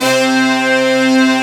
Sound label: JD800 FULL B; Best Service; Alpine Volksmusik; BRASS+BASS